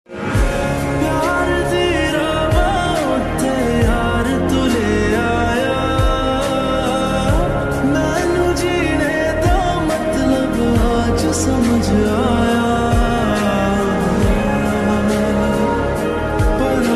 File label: lofi ringtone